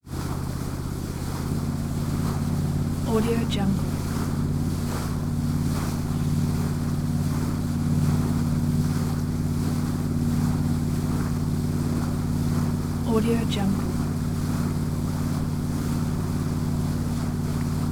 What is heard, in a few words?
1977 chris craft twin engine fishing boat; prosoundfx